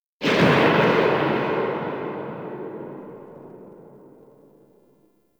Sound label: Tail, Weapons; Strun Vandal